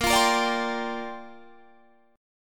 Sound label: A sharp 6th Add 9th, Guitar Open D